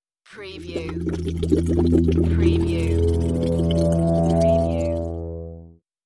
Scifi Audio Logo Ident,Cauldron,Boiling,Synth Swell